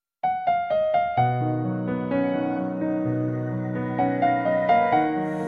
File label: Cute Sms